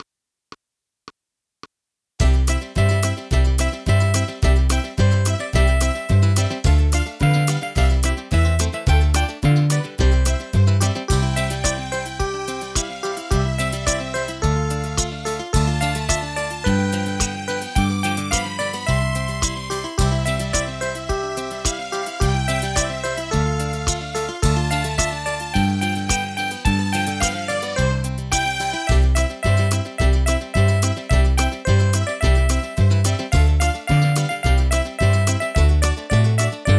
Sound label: JINGLE BELLS, CASIO CTK-220L